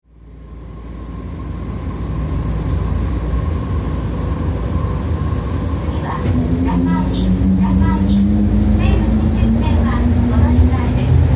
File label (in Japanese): 都営大江戸線 落合南長崎→中井 / 中落合2丁目交差点地下の爆音フランジ音でネット記事になった区間（東京新聞いわく120dBらしい）